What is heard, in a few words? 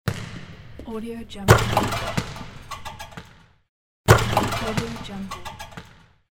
basketball dunk; medusamusiclab